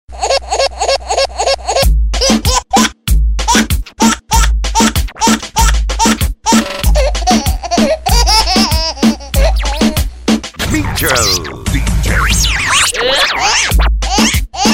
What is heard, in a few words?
Funny Baby